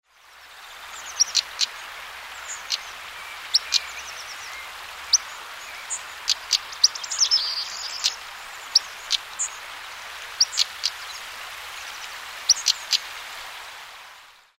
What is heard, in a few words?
stonechat